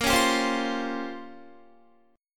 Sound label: A sharp Minor 6th Add 9th A sharp down Guitar, chord; A#m6add9; strummed